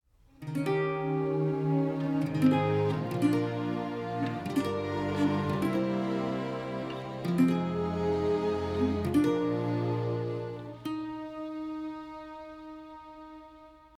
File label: (Cont_) [Instrumental]; Frozen 2 - Hindi (2019)